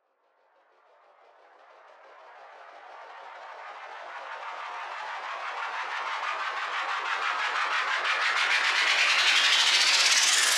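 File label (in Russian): вжух шумный с помехами усиливающийся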